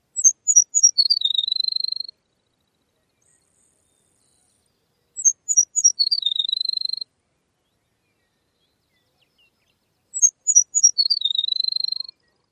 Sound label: songs